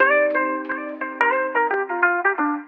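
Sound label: sax almost a guitar, Loops; Phonky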